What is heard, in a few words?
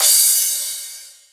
urban crash cymbal d sharp key; drum sample; one shot